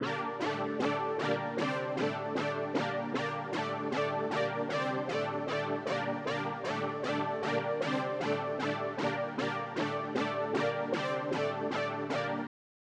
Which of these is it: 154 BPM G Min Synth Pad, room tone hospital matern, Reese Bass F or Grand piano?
154 BPM G Min Synth Pad